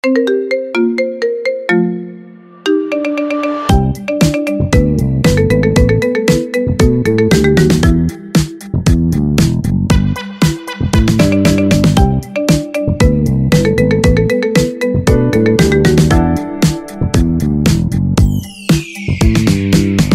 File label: Marimba